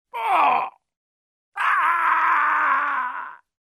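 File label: male moan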